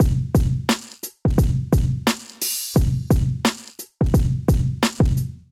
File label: MPE Kit 87 bpm, Golden Era Hip-Hop Drums; MIDI Clips; Black Squid; Sound Oracle